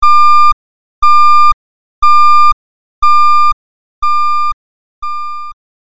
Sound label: back up beep